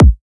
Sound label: kick oneshot pow, Pop; Drums; SD PASADENA Pop Sample Pack; Slate